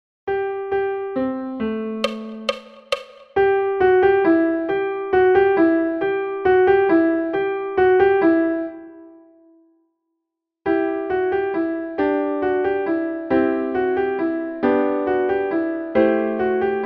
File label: bells all